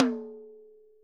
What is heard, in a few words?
ROTOTOMS; Sonic Reality